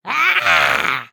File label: Air Fall; Lego; Strombie; creatures organic